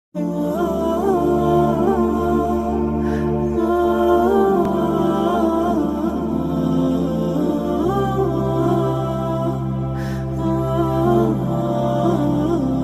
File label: islamic ringtone and background music